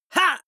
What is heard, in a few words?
male fight shout short; comedy-cartoon; soundpool